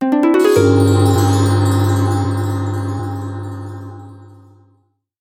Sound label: Level complete; Rezrog